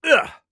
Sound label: Assassin Grunt Hit; Six-Shot Cyborg; Realm Royale